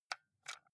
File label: console controller xbox home press slow